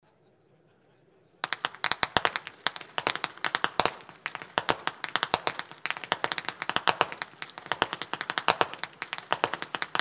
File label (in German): schnalzen; AUFHAM III; rupertigau